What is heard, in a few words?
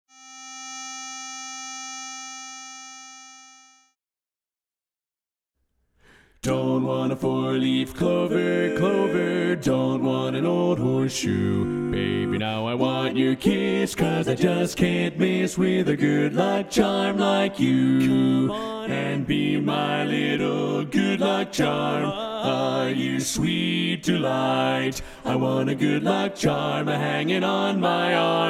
bass left